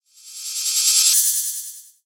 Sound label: heal